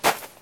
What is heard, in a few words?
sand 2 land; Golden Crayon Sounds